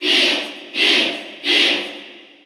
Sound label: Cheer Russian; Pit; SSBU